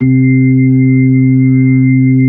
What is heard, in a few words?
B-3, Brite; Keyboards of The 60's and 70's